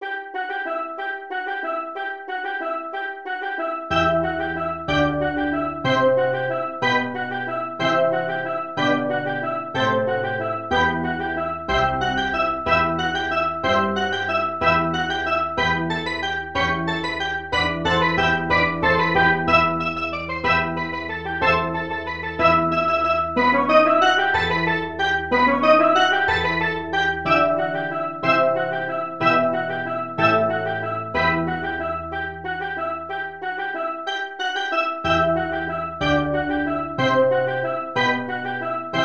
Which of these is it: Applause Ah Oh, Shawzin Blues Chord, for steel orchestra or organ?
for steel orchestra